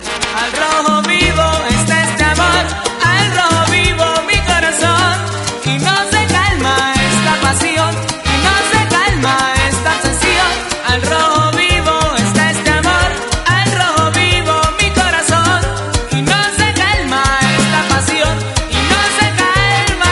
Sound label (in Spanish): a ritmo de salsa; tono de llamada